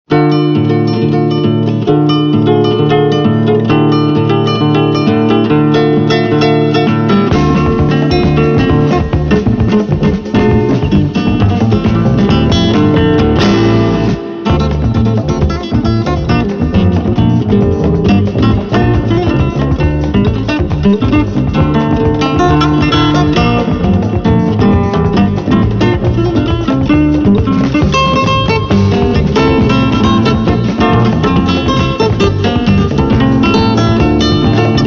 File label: Samba menor